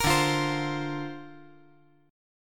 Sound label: D-Minor 7th Sharp 5th D, chord